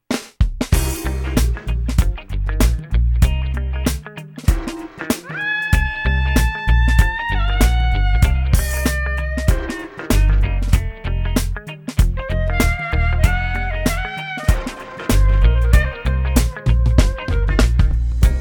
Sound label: groove